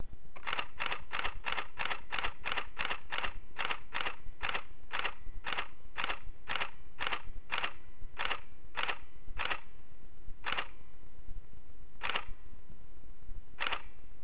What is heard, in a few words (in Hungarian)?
zár, Nikon D40X